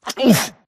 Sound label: grunt hits; Titanfall 2